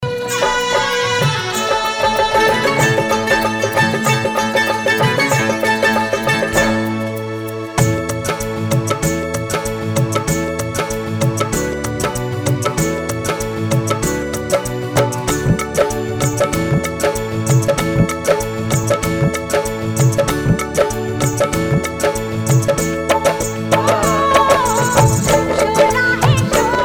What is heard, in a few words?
Female